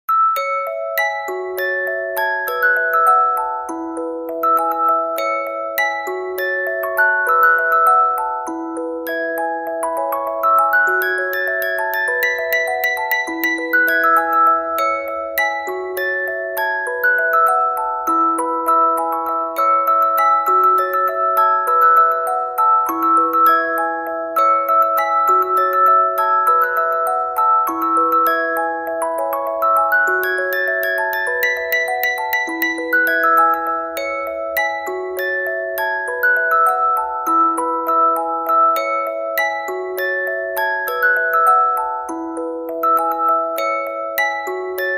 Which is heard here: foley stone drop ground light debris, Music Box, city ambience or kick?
Music Box